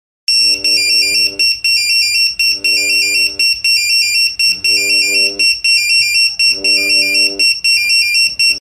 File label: RNLI Pager